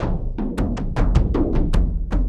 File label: Frame Settle, Kendang; Sonic Foundry (Sony Creative Software) - Friendly Fire; Drums